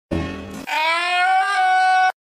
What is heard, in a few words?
black bird screaming; meme